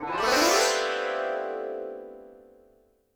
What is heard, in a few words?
ethno, 125, A